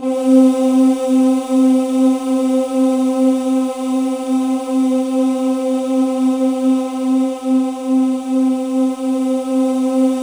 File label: C3, Synth Leads; 90 s Sample CDs; NiceBeats (NiceBytes); Studioline 09